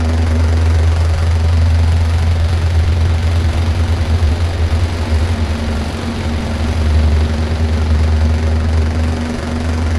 helicopter loop; Transport

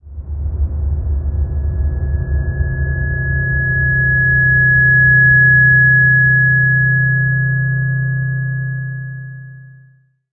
low to high, ambience drone

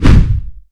wind sweep heavy; mono

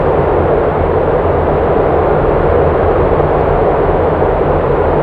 city background loop; Transport

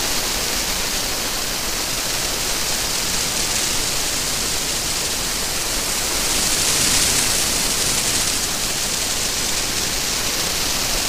rain loop; Nature-Weather

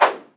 wind sweep lite mono

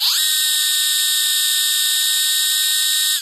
motor mono, tool

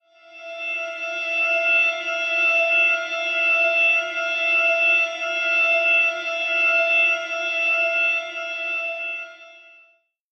horror, ambience drone

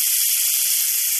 clockwork loop; mono

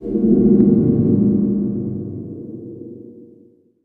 ambience oneshot, ambience drone

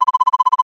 computer search loop; mono

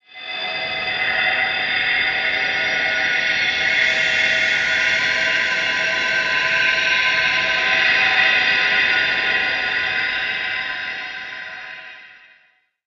horror, ambience drone

